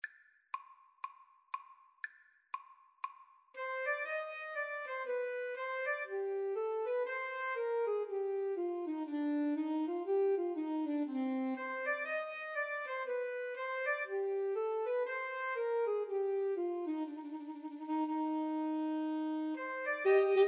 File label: bach bouree, 2 Altos Tenor Sax